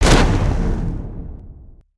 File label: charge